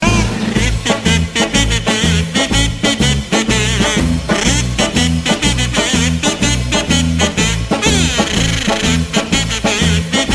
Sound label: the kazoo music ringtone